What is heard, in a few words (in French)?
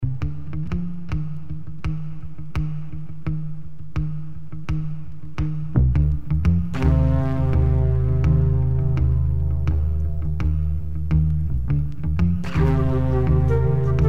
flutes libres